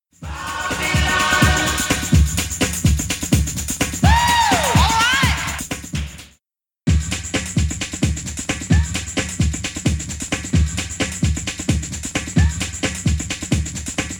Beat

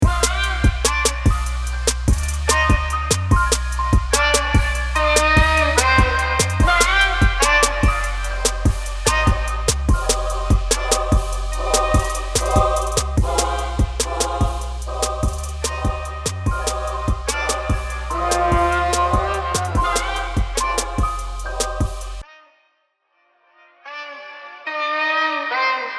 ccm beat

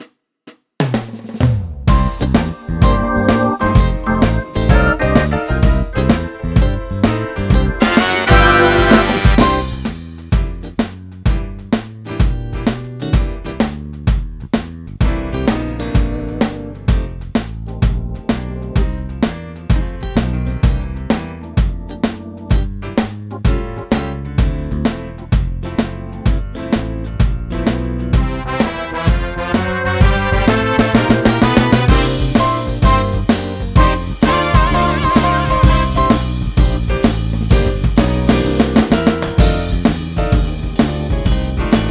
blues

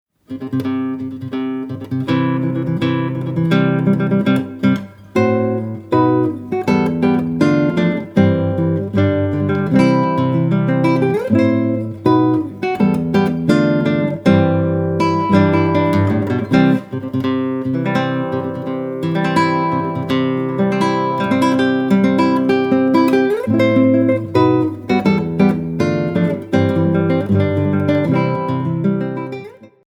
Wedding March; Mendlessohn

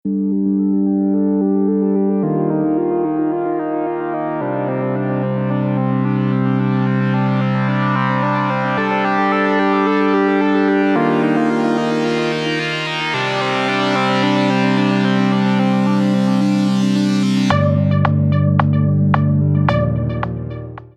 Pad & melody

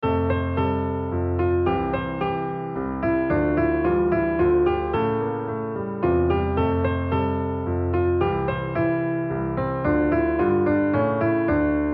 16 bar melody

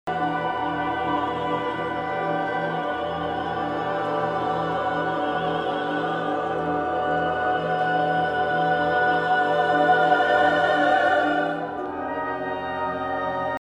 Clocks And Clouds; Ligeti

